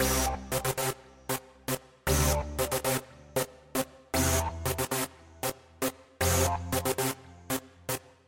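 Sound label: random synthesizer loop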